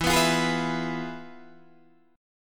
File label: F Minor Major 7th Flat 5th, E